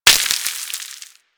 Forest Pinecone; Ambience; Props; Warframe; Grineer Forest

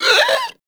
Infested Runner Pain; Warframe; Runners

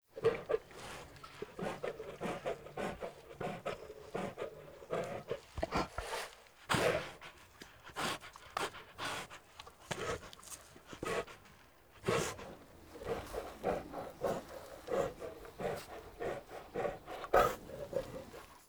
Wolf Breathing Run Loop; Animals

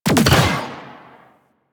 Attack Drone Rifle Fire; Warframe; Venus; Venus Terra Attack Drone; Weapons

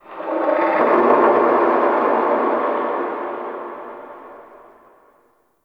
Under City Antique Rattle Distant

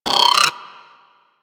Sapient Pistol Reload; Warframe

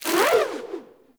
Rope Winch Rope Retract; Puzzles

